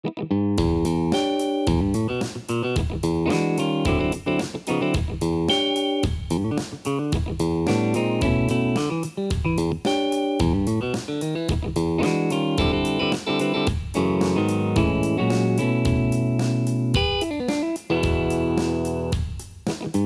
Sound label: Funk in E; gitarove materialy